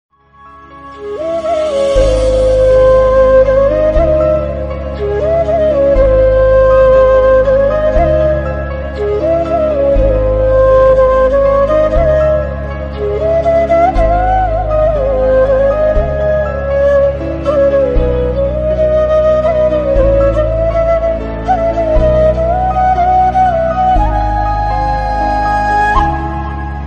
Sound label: flute ringtone bansuri